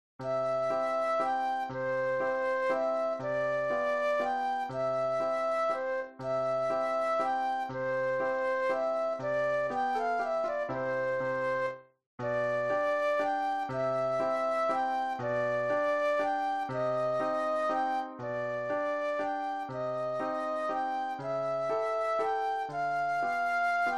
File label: Petite Danse Flute Solo; classical; Gurlitt